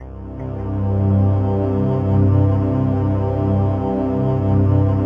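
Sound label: PAD, atmospheric pads; Best Service; XX Large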